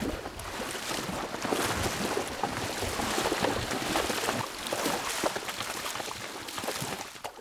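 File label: animals water footsteps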